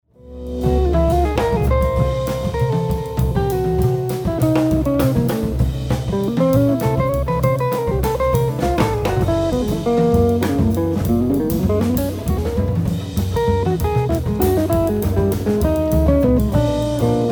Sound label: riff